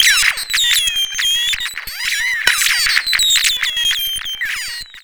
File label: Cwejman S1 Shortwave Drum Circle